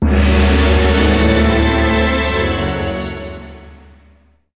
Opening (Stinger)

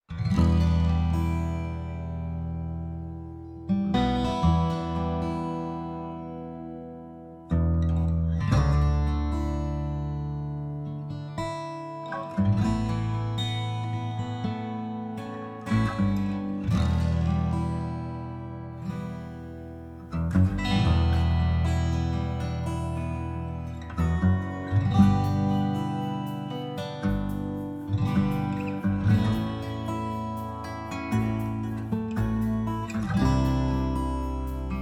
Western Night; Clouds & Sheep 2

